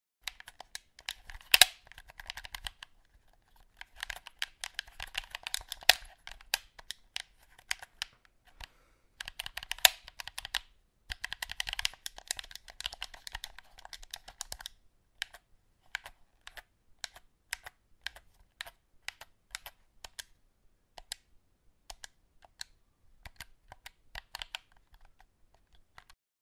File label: Game controller